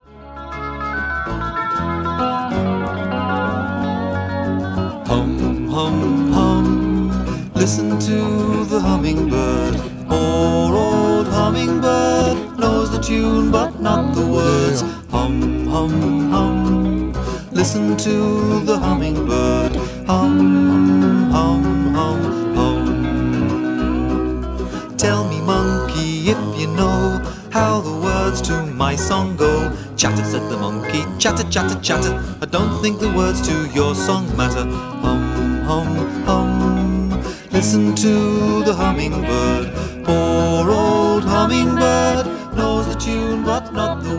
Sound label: Hum Hum Hum